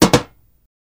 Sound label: Telephone Set Down